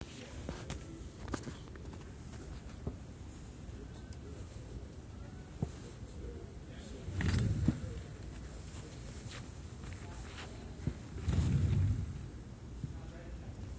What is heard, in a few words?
rolling